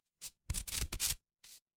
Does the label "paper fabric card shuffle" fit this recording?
Yes